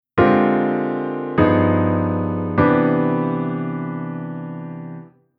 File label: Chord Tension IIm7